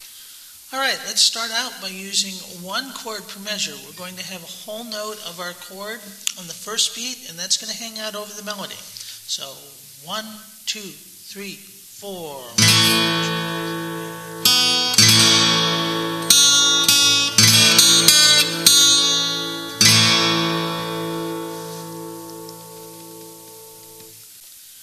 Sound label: DRUMMER